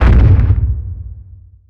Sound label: big step; Fate The Cursed King